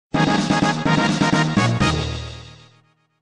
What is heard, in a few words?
music receive item; chrono trigger snes